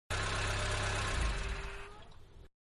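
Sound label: Small Car Idle Off Exterior; Car Small-Idle And Off; Vehicles; Manhattan Production Music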